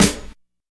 treble heavy snare single hit c key

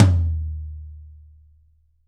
roomy tom drum f key; tom drum samples; one shots

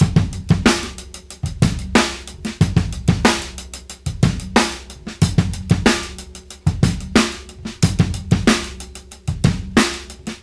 92 bpm drum loop sample g key

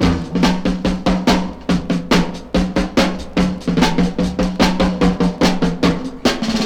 144 bpm drum beat f key

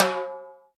short reverb tom one shot c key

